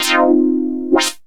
chord, Drum & Bass, 168 BPM; Ueberschall; Drum & Bass Flash Trackz

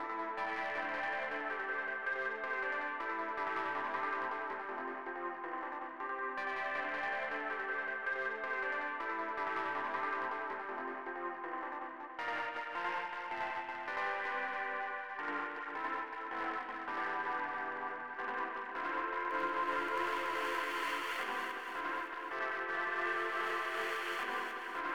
ep delay

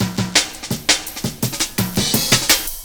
Roll On Roll, Jungle Breaks; M8

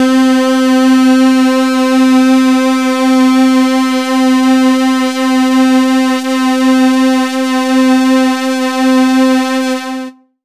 syn, Chillout Synth Atmos

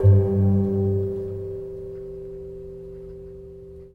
Gong; Sonic Foundry (Sony Creative Software) - Friendly Fire; Single Gongs

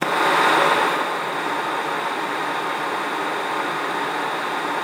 luft, alto sax; Xsample VOL-2

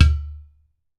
UDU TONE, African Perc; E-MU Formula 4000 Series Vol. 4; Earth Tones

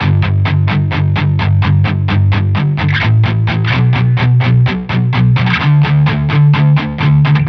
Distorted Guitar 128BPM Bmin; Vengeance - Essential Clubsounds Vol.4

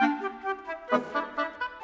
Winds, Orchestral; Sonic Foundry (Sony Creative Software); Orchestral VOL-2; Modern 23

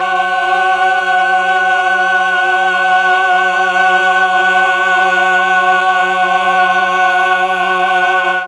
F#3 8VOICE CH, Mellotron; Mike Pinder - Mellotron Archive CD

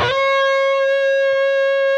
PRS D, Guitars, LEAD

